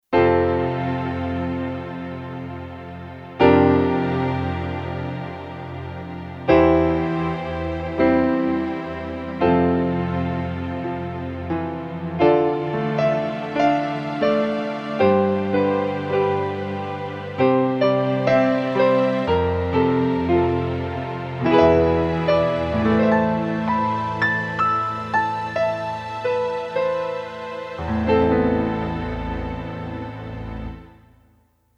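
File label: Ballad Piano & Strings, Kurzweil K2500X; Factory Programs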